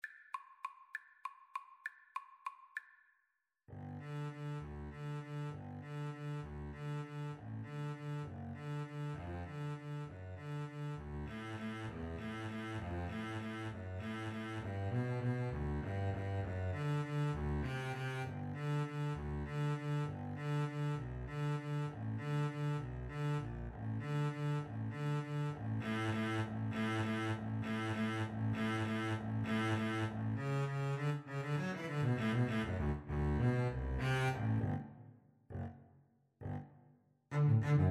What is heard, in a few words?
Violin Bass Duet; tchaikovsky waltz